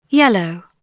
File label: yell; Dorling Kindersley My First Incredible Amazing Dictionary